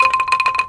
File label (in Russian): siyanie luchej dolgij blizkij